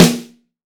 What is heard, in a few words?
P-SN, PICCOLO SN